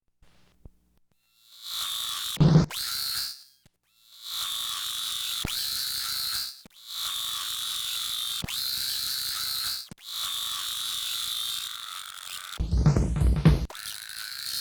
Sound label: High Speed Tape